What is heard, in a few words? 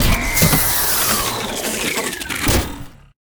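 Mech death, monster; steamer crazy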